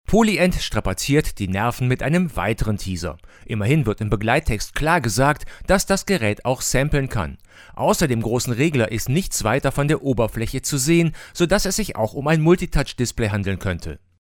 GAP Pre 73 DLX MKII Gain 50 Pad 0 HP Off

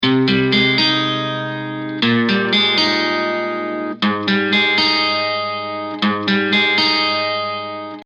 GuitarClean Dry

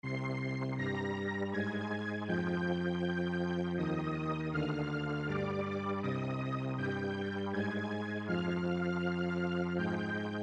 String Ensemble

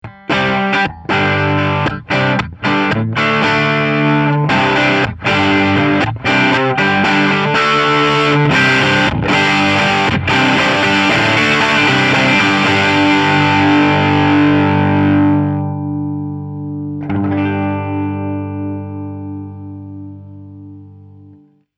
Triode Driven By Volume

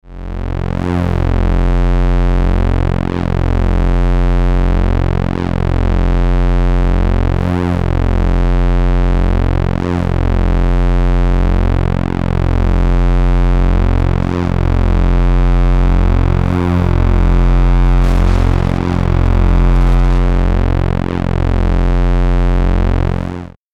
Synthesizers A1 Bassdrone Dry

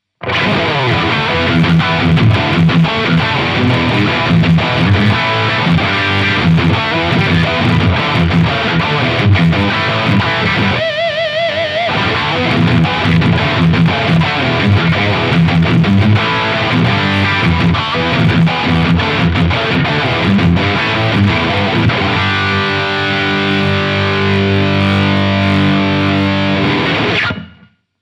Humbucker Both Channel; Wampler Gearbox

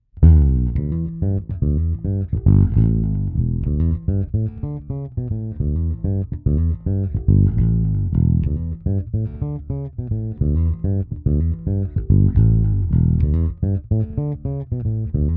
Bridge Lomid Boost Bassboost

